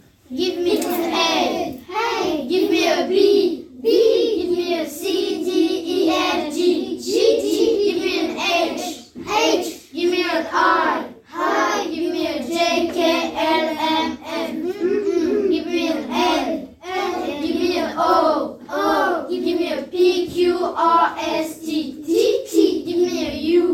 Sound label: the alphabet rap chante par la classe